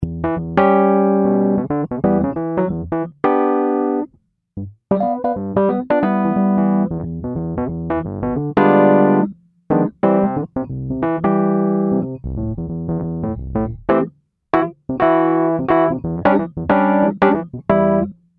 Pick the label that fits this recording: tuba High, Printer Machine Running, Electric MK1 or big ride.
Electric MK1